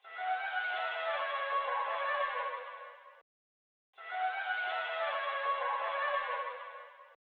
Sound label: 122 BPM B Min Vocals; Loop Stems; Cymatics; 2022 Melody Collection Platinum Expansion; Schism